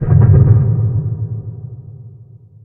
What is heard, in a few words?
hit, underground; ambient